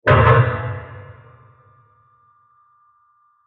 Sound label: Bursting Metal Impact; Impacts; Bursts; CraftedSignals